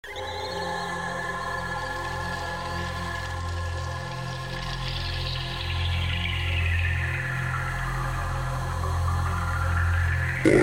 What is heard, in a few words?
SYNTHETIC NOISE INTRO